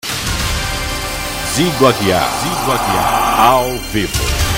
vinheta

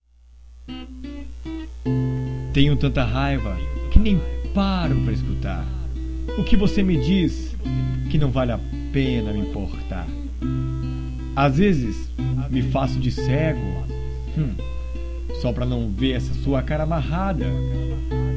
uma voz uma viola caipira

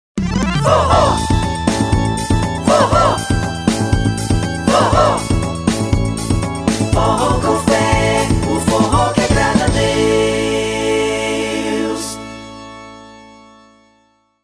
forró; 2n studio

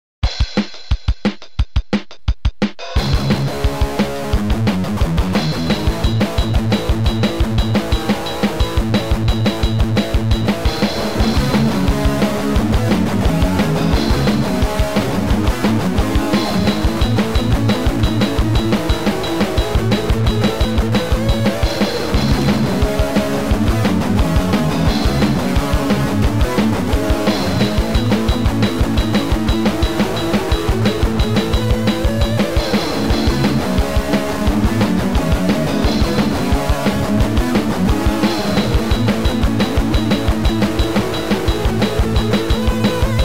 cemiterio e guitarras

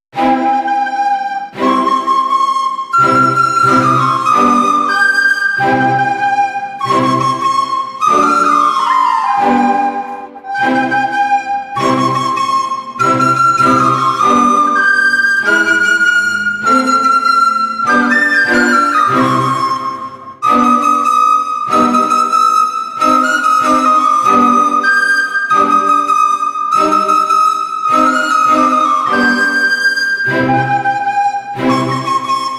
flautas de ouro clarinadas

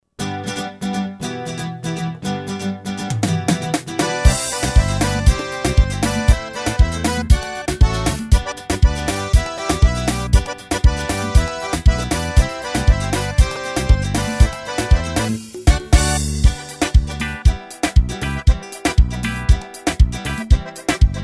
ritmos vaneirao sertanejo lento; roland g70

